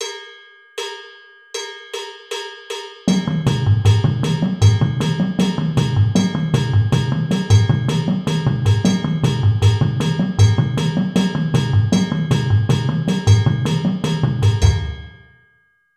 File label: 8 7 basses 156bpm